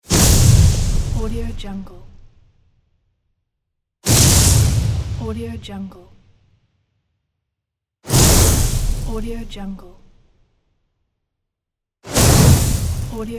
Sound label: fireball